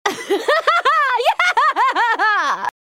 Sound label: miss fortune laugh; League of Legends